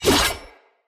Dendrit Dagger Whoosh, Swords; Weapons

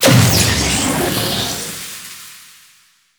Energy Drain; Gameplay; Warframe; Entrati; Vaults

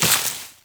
Step Veg, Footsteps, Walk; Warframe; Characters; Tenno

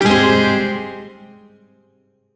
Shawzin Phrygian Chord K; Music; Warframe; Duviri; Chords

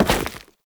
Step Goo; Warframe; Oraxia; Foley

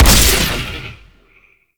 Amplifier Chassis Fire; Weapons; Operators Amp Chassis Part B Fire A; Warframe; Amplifiers

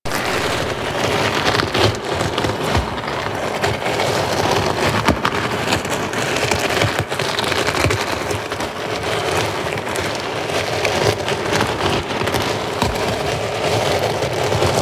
Proto Infested Ancient Drag Loop; Abilities

